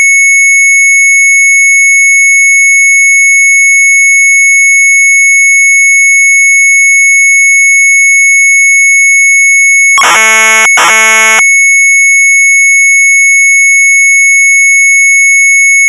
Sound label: MZ800 Tape, sharp; Talstelsels; retromelsarchive